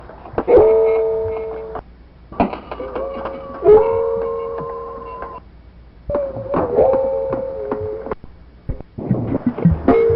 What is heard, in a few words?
walrus